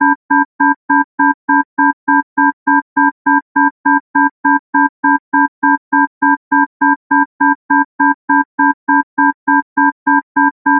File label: Alarm Space Warning System